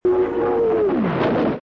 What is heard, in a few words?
SONIC BM